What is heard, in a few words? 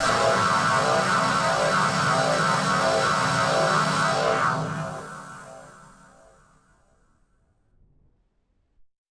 Battered Synth; malfunctioning synth